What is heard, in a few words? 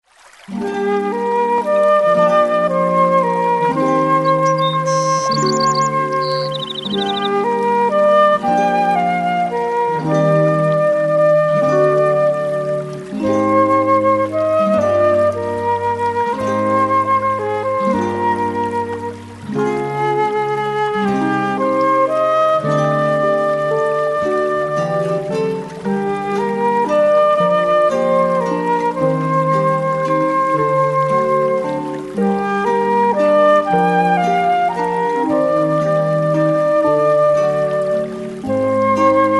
Nature Flute